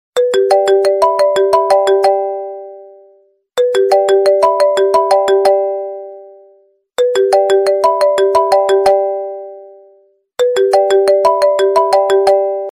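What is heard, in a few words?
iphone original ringtone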